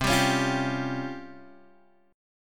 C sharp Major 7th Flat 5th C

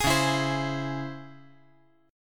C sharp Minor Major 7th; C#mM7 strummed; 13,13,12,15; JGuitar

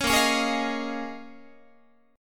A sharp Minor 11th A sharp down Guitar, chord; A#m11; strummed

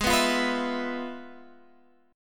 F Minor Major 7th Flat 5th, G sharp

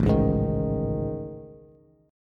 A Major Sharp 11th, Bass, Bass Standard; AM#11; strummed; JGuitar

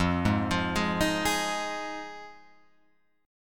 F Minor 7th F Guitar Open F; arpeggiated; JGuitar

